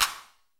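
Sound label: DNC CLAP, TECHNO TRANCE; Pro-Rec - Synthsonic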